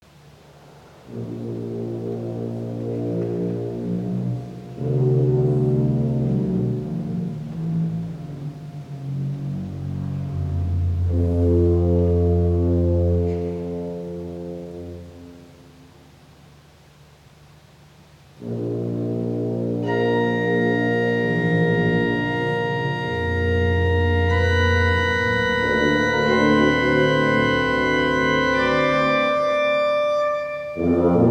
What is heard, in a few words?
improvisation; konzert; nurnberg